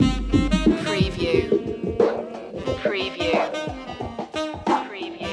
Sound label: this is not jazz loop d